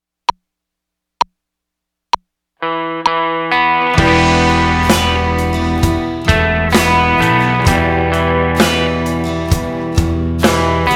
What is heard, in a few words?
Wayfaring Stringer